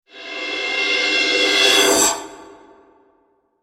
Horror Cymbal Reverse Reverb; Production Element; Scary Soundscape Sound Effects

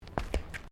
FOOTSTEPS THONGS (FLIPFLOPS) TILES STEP; Footstep Foley; Human

